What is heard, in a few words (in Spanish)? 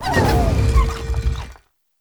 Grito de Tinkaton